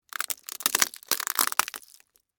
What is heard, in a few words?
Crack Crunch Slow, Texture, Crack, Crunch, Slow, Penne, Rigate; Food; Household; BlastWave